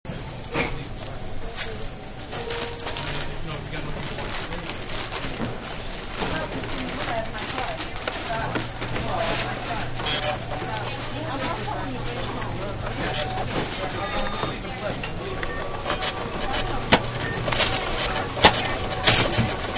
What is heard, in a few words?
Supermarket Big Checkout; Stores and Malls; Backgrounds; Manhattan Production Music